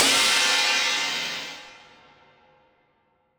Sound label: Crash, impact samples